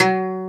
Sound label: Oud F#2; Multisamples; Digital Sound Factory Planet Earth